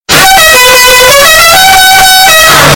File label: dixie horn xtra loud